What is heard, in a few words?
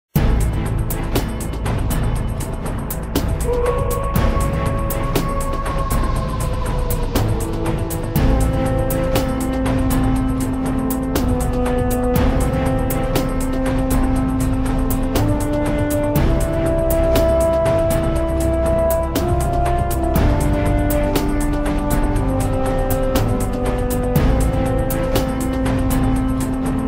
theme song lobby; wolfteam